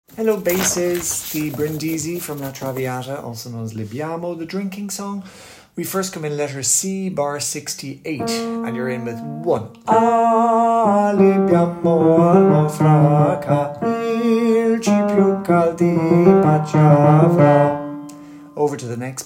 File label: Brindisi Libiamo bass; Ave Maria - Caccini